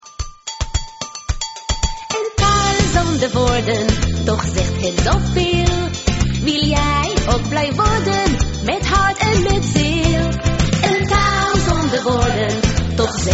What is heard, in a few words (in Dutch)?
muziek